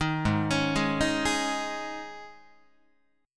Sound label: Ab Suspended 4th down Guitar; Absus4 arpeggiated; 6,4,4,6,7,7; JGuitar